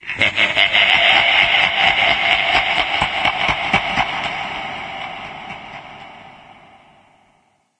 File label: laugh; trespasser